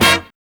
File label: STAB; E-Lab; X Static Goldmine 1